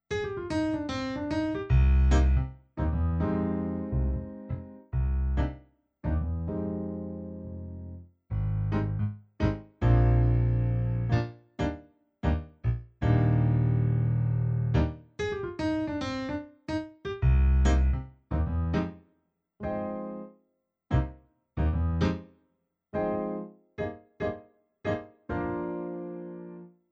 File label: Numa Concert Stage Grand